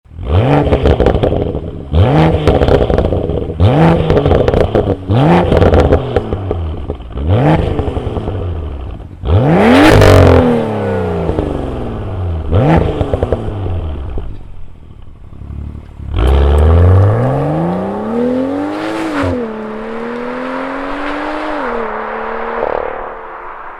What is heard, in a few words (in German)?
N55 Abgasanlage Sound; infinitas